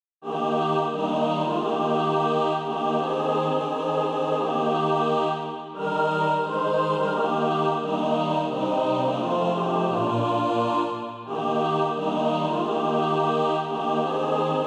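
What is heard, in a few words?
mixed, Sheet music (SATB) including Practise Music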